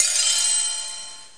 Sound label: pipe chimes